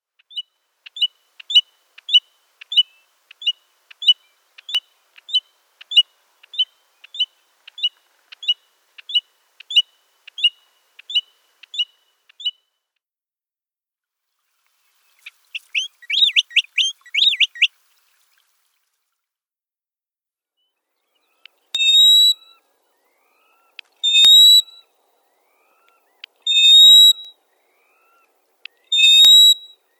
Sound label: American Golden Plover